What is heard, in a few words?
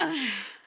Grunt; Lewd SFX